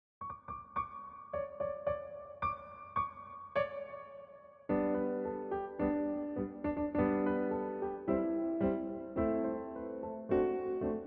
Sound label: Jingle Bells